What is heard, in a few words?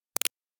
spatter notification; application user interface ui sounds